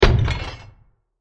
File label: Smashed Metal Impact